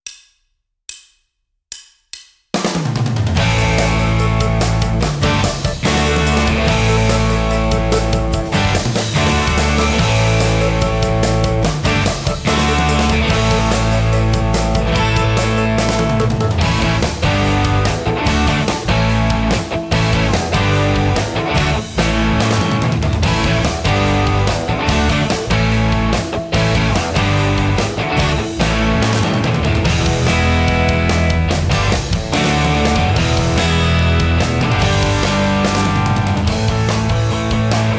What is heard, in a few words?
Rhythm Guitar